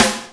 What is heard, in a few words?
DR550 Snare, Boss DR-550 Drum Machines; 300 Drum Machines